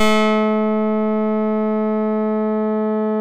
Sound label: EMU MODULAR, modular syn